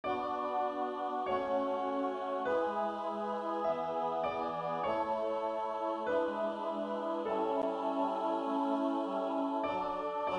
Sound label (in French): Sopranes; Hail Holy Queen